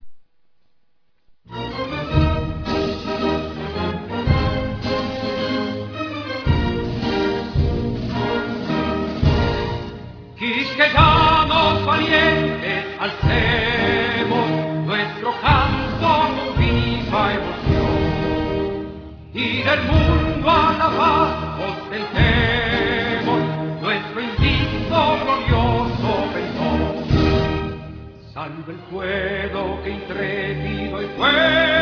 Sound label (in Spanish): himno